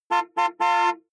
car horn chopped exterior hummer h3

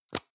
plastic can lid screw open hard; objekte; dose

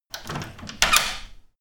locking wooden door

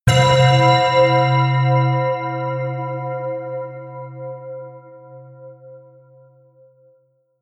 melodic bells

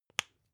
spice jar small close soft; industrie wirtschaft; gastronomie

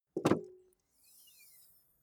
car bmw e46 exterior bonnet open

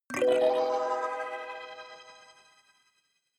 notification kalimba magical slide minor

